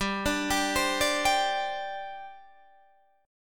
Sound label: G Suspended 4th; Gsus4 arpeggiated; 15,17,17,17,15,15